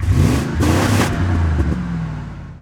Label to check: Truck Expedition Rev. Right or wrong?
Right